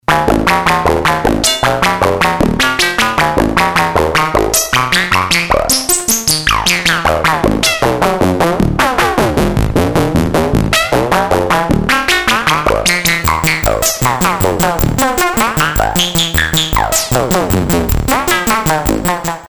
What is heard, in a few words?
Plan-B Model 25